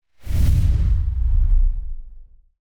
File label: Whoosh High Energy Sub; Hollywood Edge; sound designer's toolkit v2